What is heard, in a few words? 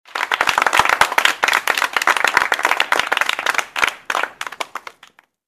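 applause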